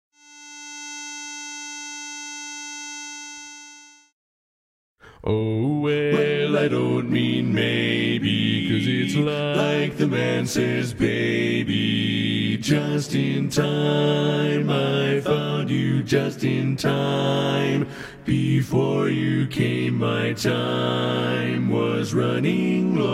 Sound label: bass